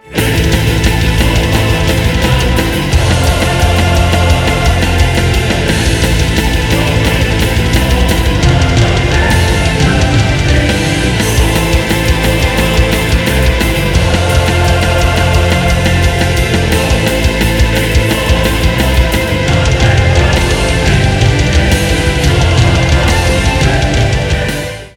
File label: Waterfall